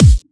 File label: Bassdrums Clubhouse; Kicks; vengeance essential club sounds-3